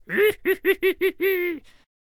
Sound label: Scarecrow Attack Vocals